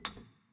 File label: ambience bottles; dead or alive 2